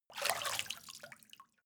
step water walk out

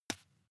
juggle catch soft

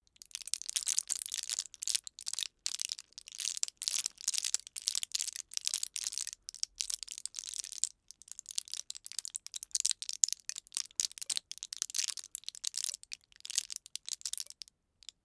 plastic drug blister bend crackle

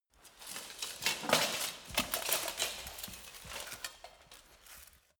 light get up

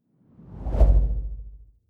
whoosh impact soft wobble bass

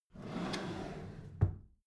empty drawer smooth close soft

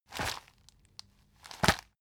plastic bag hit hard

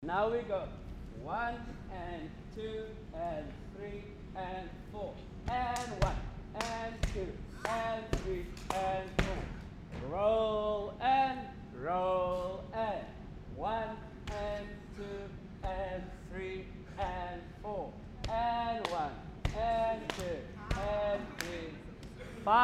musical dance practice

